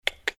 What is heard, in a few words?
tongue clicking